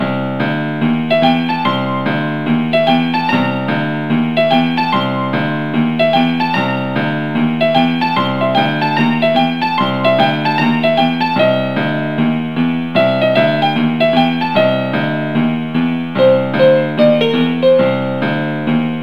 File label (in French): pentadecaphonique